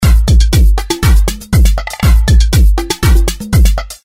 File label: rhythm loop Elastic flanger; Sound Graph